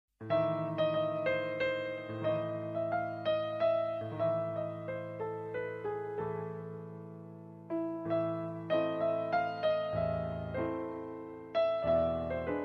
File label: mazurka